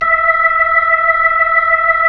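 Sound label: B-3, Hammond B-3 organ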